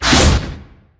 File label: Pause Screen Door In; Angry Birds Star Wars 2